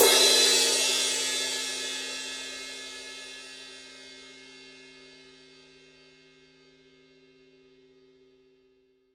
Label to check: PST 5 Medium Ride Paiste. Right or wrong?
Right